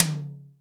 ATTACK TOM; Big Fish Audio; Synth City

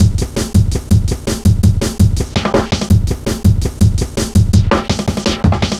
Hard Edged, Drum Loops, 165.5

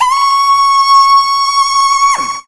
vox special

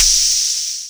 open hihat; Techno Trance Essentials; DRUMS

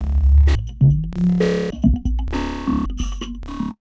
JUMP 130 FX, Big Beat Drumloops; USB Soundscan vol.25; AKAI

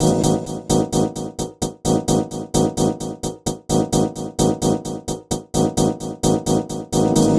Chd Fx Loop 130; Equipped Music; Loungin House

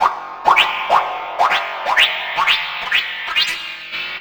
Rhythmic SFX, EDM; Sonic Foundry (Sony Creative Software); Afterhours EDM

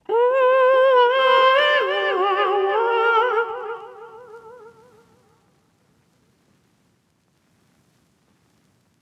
Soul Vocals Gm (Wet) 140 BPM, Melodies; 99Sounds x Monosounds

